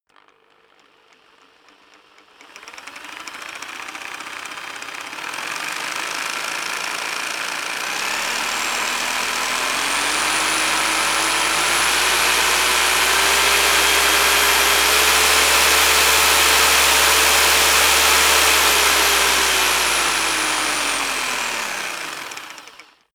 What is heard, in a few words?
rock drill hammer speed up heavy; maschinen; bohrer; soundpool